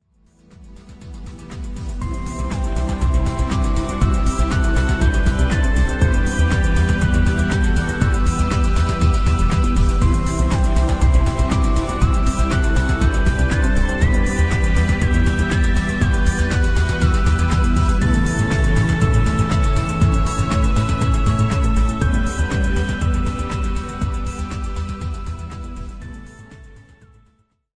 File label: industrial classic